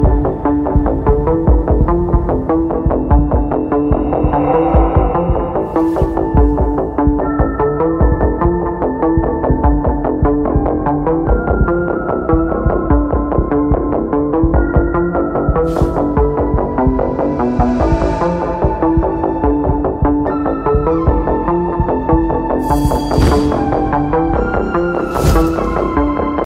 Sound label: ringtone, english